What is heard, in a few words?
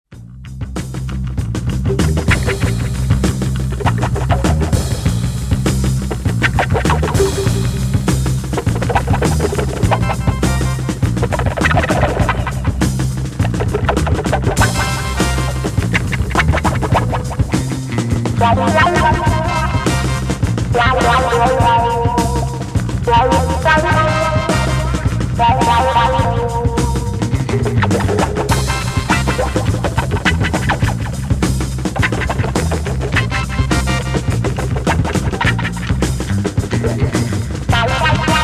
percussions